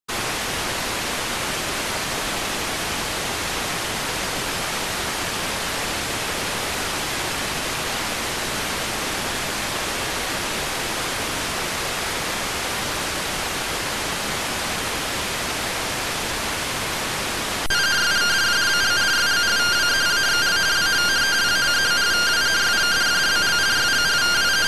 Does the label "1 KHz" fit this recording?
No